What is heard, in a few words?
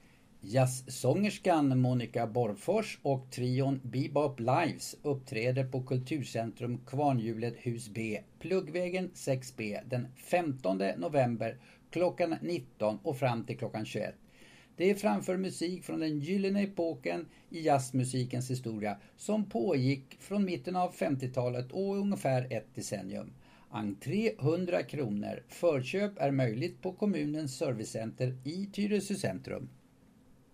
Jazz